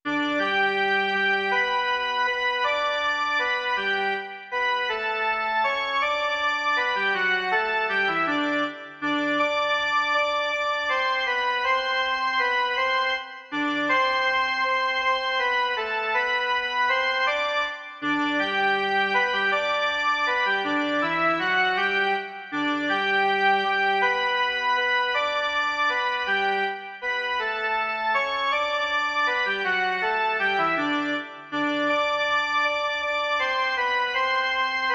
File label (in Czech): jen melodie; Narodil se nám Spasitel